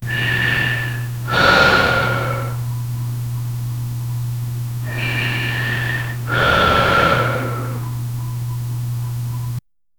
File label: a heavy silence for a